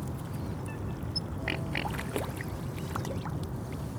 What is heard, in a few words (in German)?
hoeckerschwan